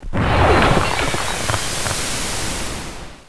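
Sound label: sizzle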